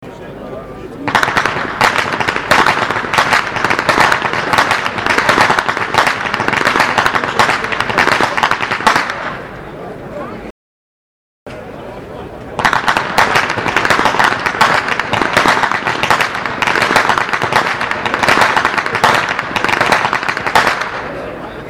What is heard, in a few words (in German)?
schnalzen; steindorf